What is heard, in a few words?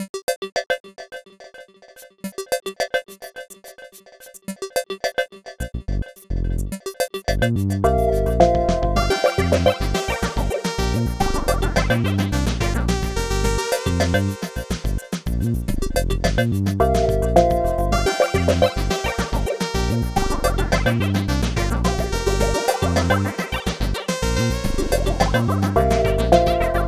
Funky Jingle